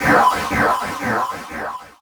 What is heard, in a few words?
Title Transition Blast Energy; Adobe; Production Element